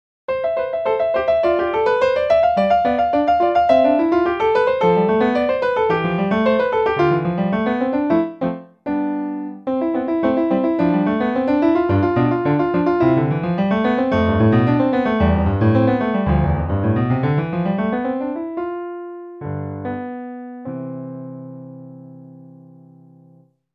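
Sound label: etude on white keys, piano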